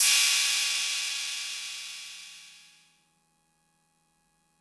cymbal; MFB-522

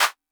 clap; MFB-522

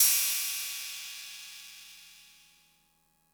cymbal; MFB-522

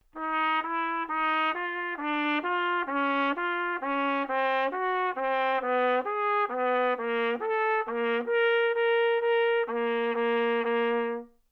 trumpet Ds4 phrase mezzo forte tenuto; solo instruments

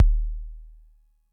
kick; MFB-522

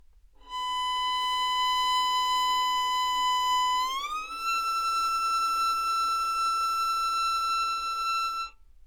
Two Note, violin

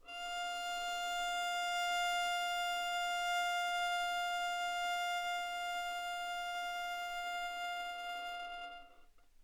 violin

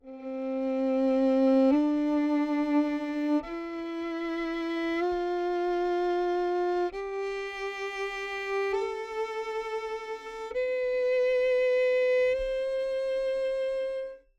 Solo, violin; DPA

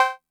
cowbell; MFB-522

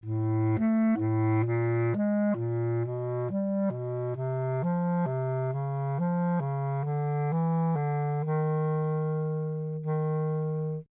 bass clarinet A2 phrase mezzo forte legato; solo instruments

